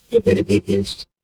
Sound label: animal bird